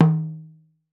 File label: NIGERIAN, African Perc; E-MU Formula 4000 Series Vol. 4; Earth Tones